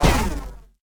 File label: lazer ricochet; AlienWay